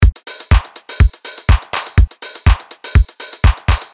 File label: Modern Chicago House